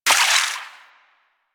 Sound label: Water Sprayer Surface Impact; Warframe